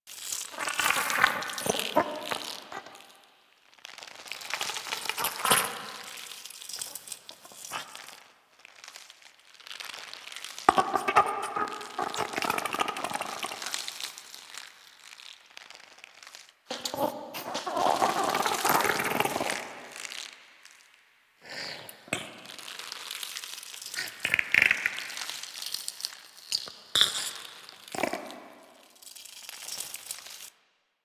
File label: Plant Chatter Loop; Warframe; Infested Eris; Ambience